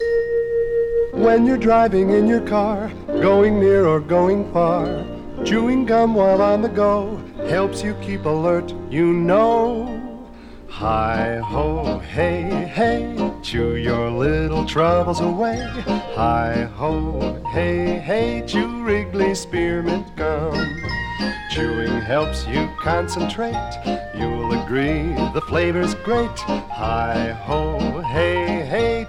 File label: Driving